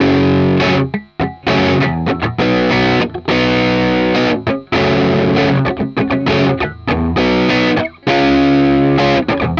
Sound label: Guitar Loops 100bpm F#maj; Guitars; Xmass; Ghosthack; Advent Calendar 2019